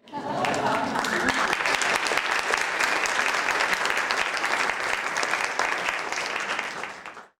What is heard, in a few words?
applause, people sound effects